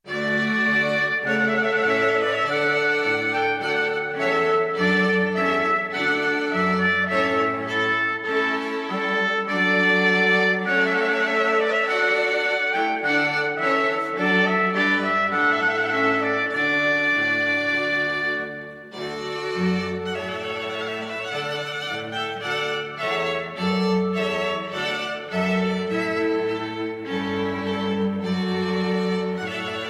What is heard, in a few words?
mixed trumpet voluntary, brass